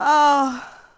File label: die; urbanchaos